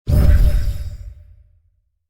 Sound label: magic teleport poof; SFX; DailySounds